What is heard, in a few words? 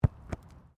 RUGBY BALL BOUNCE CATCH; Various rugby sounds; Miscellaneous Sports